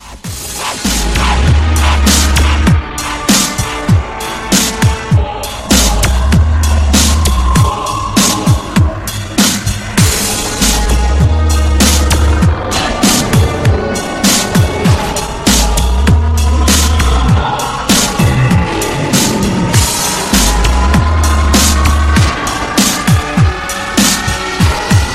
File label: Dubstep Brain